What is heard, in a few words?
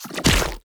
heroes basic Attack; grimson